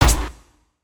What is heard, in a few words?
hit, creatures organic